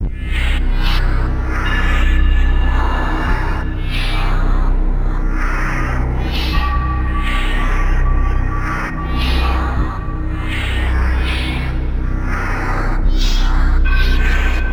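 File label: background music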